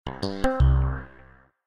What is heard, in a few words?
video error; bitrix